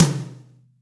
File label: Roland R-8 Room Tom